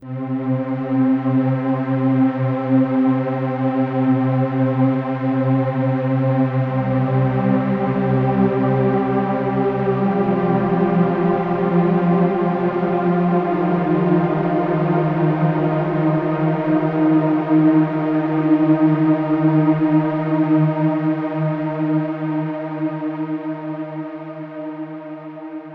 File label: Stinger Puzzle Drone Tone